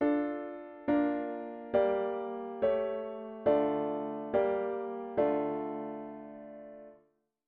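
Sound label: diatonic inverted V7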